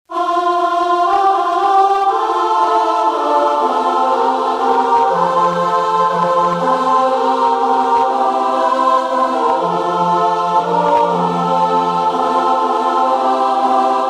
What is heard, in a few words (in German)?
Frauenchor